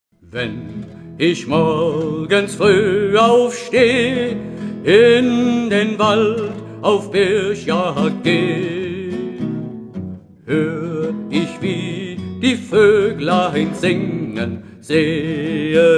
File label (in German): solo; jagerlied